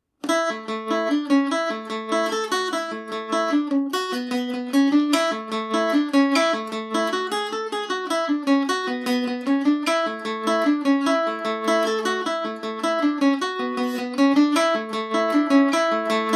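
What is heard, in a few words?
A major at 40, irish mandolin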